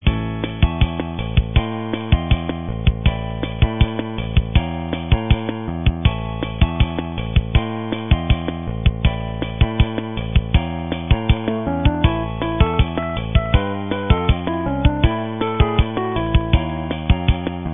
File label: latin 4 note samba, ringtones; Motorola